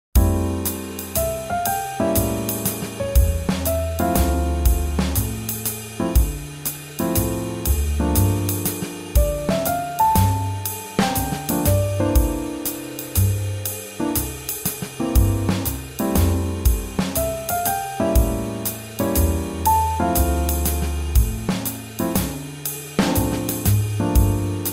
Swing Jazz

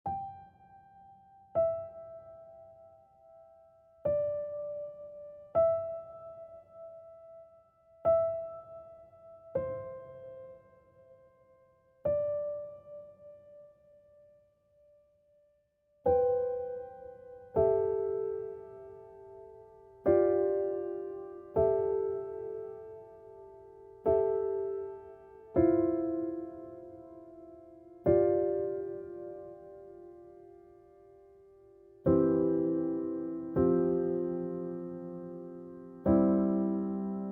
piano; 감성 BG

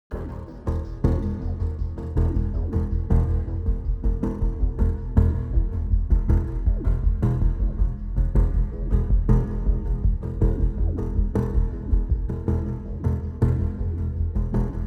Tense Effect; Tension

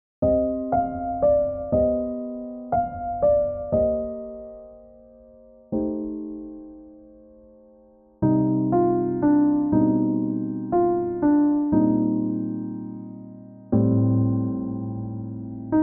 New age; 잔잔

